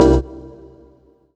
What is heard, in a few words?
ORGANIC FX; Rawcutz - Nu Forms; Gbm